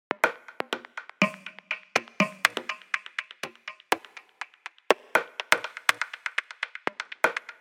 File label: 122 BPM Congas; percussion essentials 1